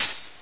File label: highhat; fmsx 2.2